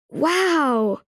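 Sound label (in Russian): ребенок восхищенно; Вау звук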